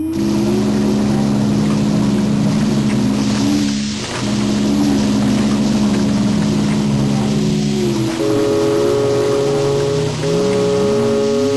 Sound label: drone